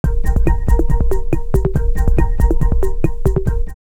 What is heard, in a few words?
Germanix 140, drumloops Rave; X-Static Goldmine; 90 s Sample CDs